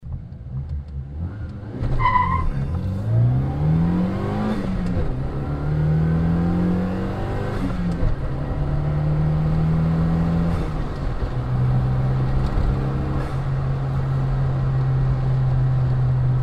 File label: copen close moving, muffler; car